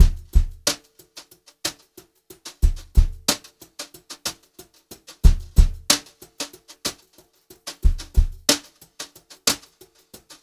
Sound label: brushed drum loops; USB Soundscan vol.39; AKAI; 90 s Sample CDs